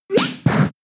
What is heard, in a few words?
Slap; pokemon sfx gen 6 attack moves xy oras; Double Slap 1hit